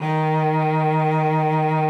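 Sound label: D#3, cello vibrato; Xsample VOL-7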